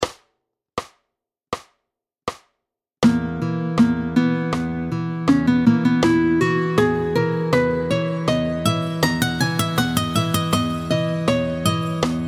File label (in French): Lydien, Le mode de mi lydien, tempo 80